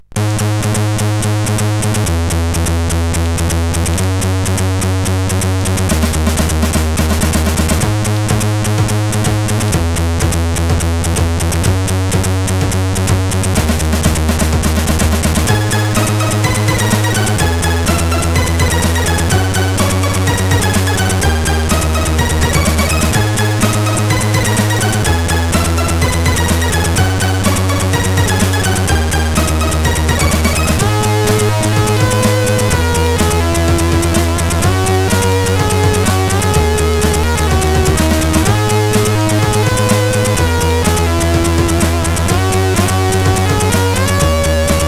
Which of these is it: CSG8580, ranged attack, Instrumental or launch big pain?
CSG8580